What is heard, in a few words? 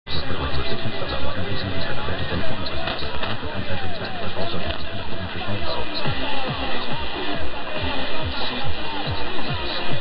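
Colombia, radio; HJAJ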